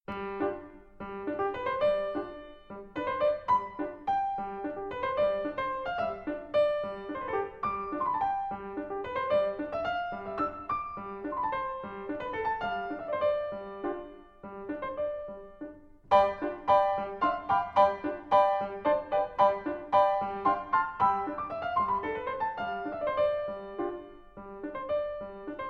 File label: Waltz